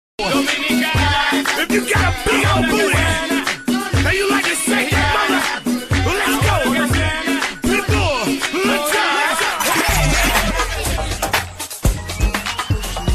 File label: english ringtone